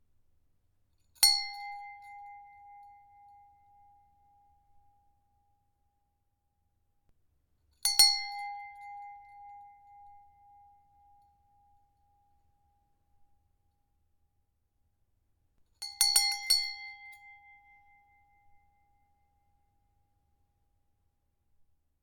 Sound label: Bell; Titanic